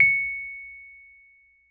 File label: C#7, Rhodes Mark II; mkII 88 key HI; CEPCo Sample Series Vol. 3